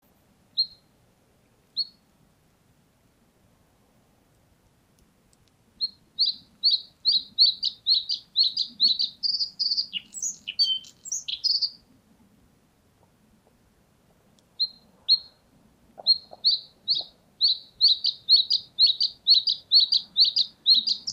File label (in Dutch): blauwborst